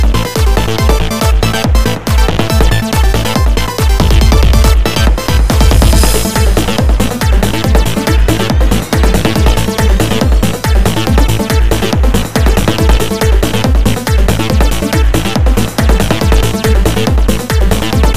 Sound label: Trance